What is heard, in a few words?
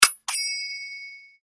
percussion C#; revealed percussion vol 1